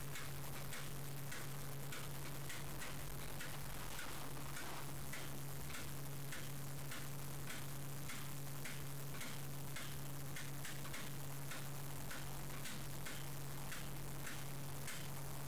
loop, Train; OpenBVE; 55022 Royal Scots Grey

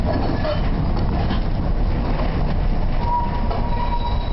Flange, Train; OpenBVE

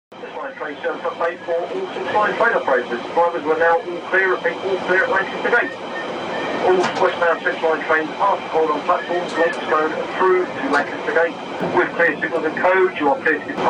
radio, Central Line; OpenBVE

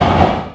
Point, Train; OpenBVE

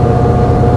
Motor, Train; GP38

